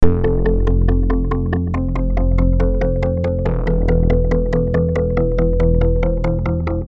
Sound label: Synth Poly